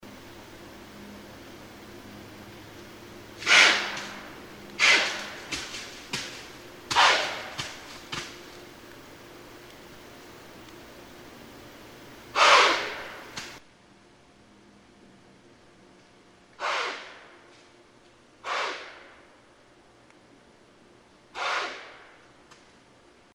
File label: White-tailed Deer